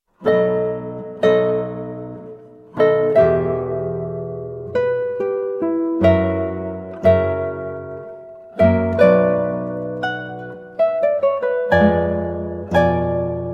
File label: Concerto in G Major Adagio, spoken, 8 String Guitar; Baroque Concertos; RV151; Antonio Vivaldi